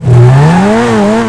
first, vehicles; turismor; gtav

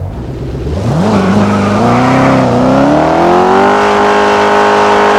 first, vehicles; 17camaro

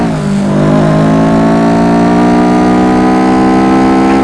3rd gear, vehicles; blista

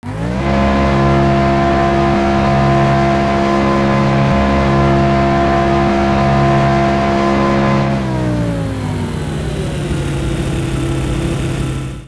limited speed, vehicles lambo2

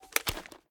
inspect drum end; reloads; gmod

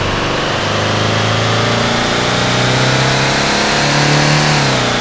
second, vehicles; gmod; tdmcars; gtav; camper

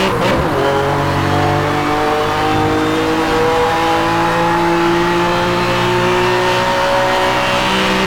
third, vehicles

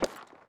menu tabs move, user interface; gmod; mw; iw8

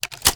reload start fast shellin, sh; iw8; romeo870; gmod

